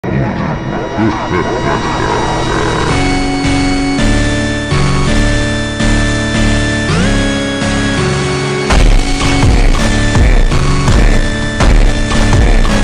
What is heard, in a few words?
ultra slowed reverb